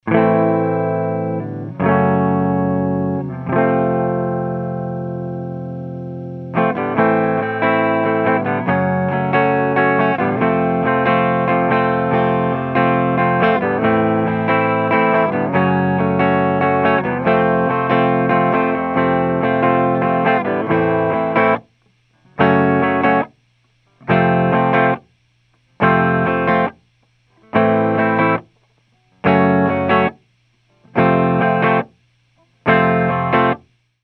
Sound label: AMP Clean RN17, clean eguitar